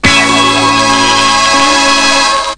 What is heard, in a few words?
show hit, chords